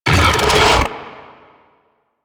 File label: Scarab Swarm Buildup; Warframe; Mummy